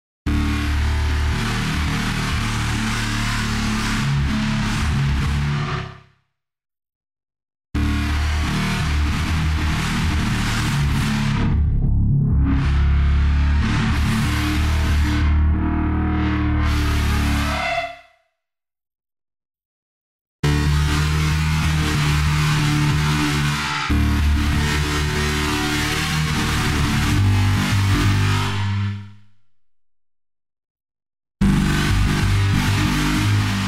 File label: WALDORF IRIDIUM KEYBOARD; Another Thing From Dune